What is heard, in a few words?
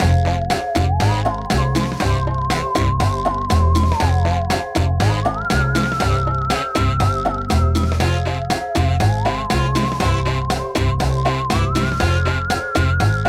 Round 3 Write Music; Jackbox Games; The Jackbox Party Pack 1; FIBBAGE XL